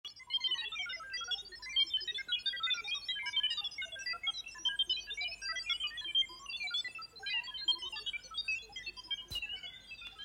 retro computer beeps

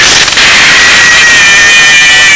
jumpscare sound; fazbear return 2

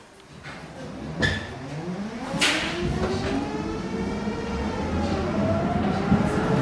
tatra; tatra kt8d5

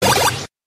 henshin sound; kamen rider ex-aid

